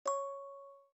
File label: ding